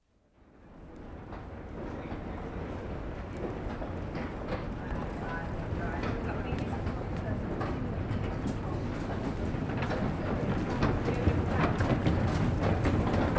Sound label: 1927 wooden escalators, escalators in London dept. store; Bourne and Hollingsworth